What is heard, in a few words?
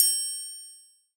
Roland Juno D Perc Menu Kit